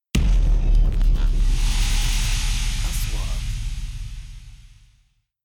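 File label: 3D SFX Trailer; مؤثرات تشويقية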